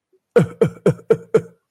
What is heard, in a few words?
ooo